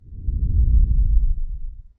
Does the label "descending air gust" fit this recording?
Yes